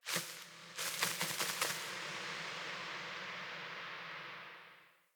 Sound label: Sparrow Fly Down; Eternium; Animals